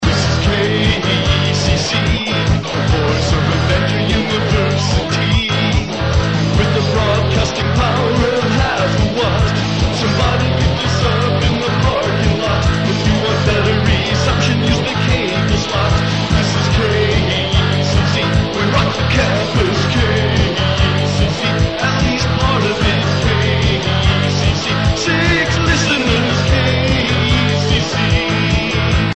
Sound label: jingle